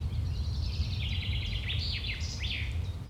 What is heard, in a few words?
birds 1shot; ambient; animal; Counter-Strike Global Offensive